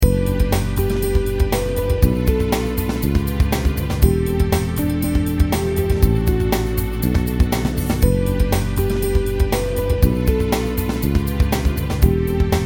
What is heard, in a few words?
updown song